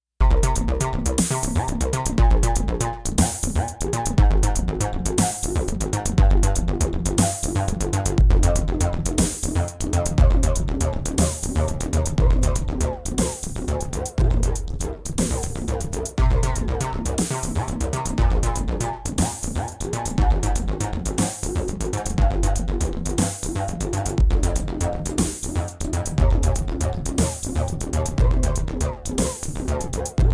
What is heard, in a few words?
Eventide H910 Envelope dry wet feedback